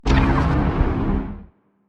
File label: confuser laser hit, weapons; biogenesis